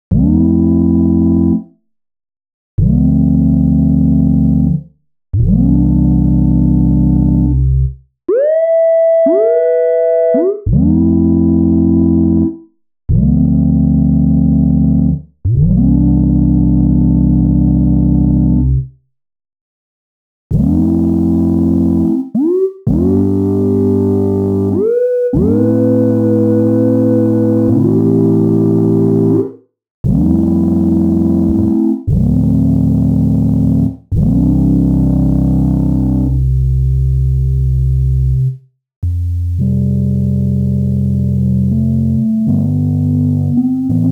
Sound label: polybrute; analog